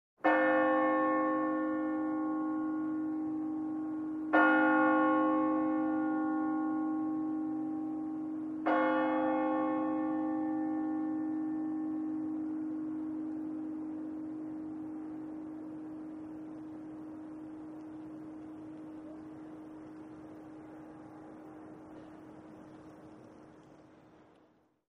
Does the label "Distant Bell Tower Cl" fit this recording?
Yes